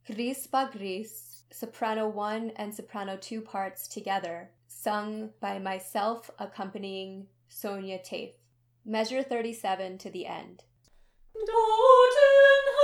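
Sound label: gris bagris soprano 1 and 2; both parts from m. 37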